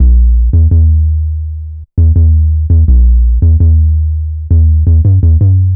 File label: Hard 808, Bass Lines 166.5; Jungle; Jungle Warfare 1&2